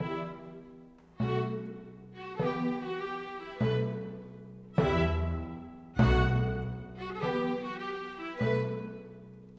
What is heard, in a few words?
Orchestra